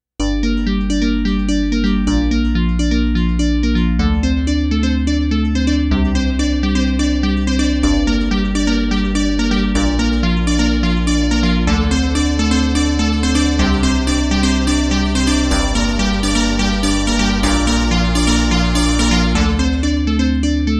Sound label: KORG Node Dual Pluck